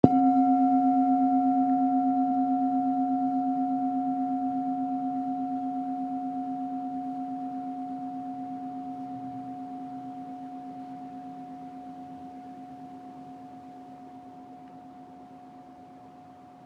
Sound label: bowl soft C3 mf; healing soundscapes